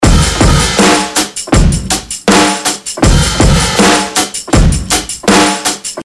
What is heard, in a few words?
DIRT LOOP DIRTY MIX WITH MED TAMBO; dirtvol2